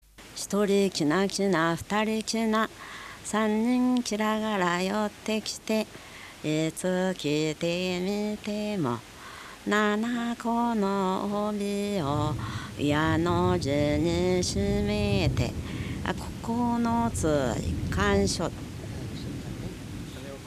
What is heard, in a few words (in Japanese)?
hanetuki, minyou